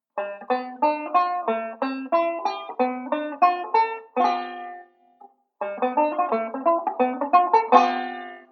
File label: Passing Pattern, chord melody; Beyond Chord Melody Volume II